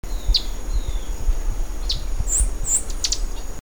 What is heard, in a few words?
Tachyphonus coronatus chip